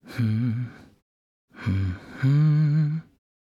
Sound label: voice humming